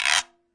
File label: arabic perc, Arabic Percussion Kit; Arabic Percussion Kit 3